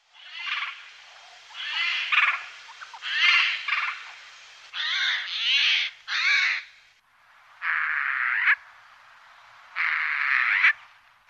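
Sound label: jay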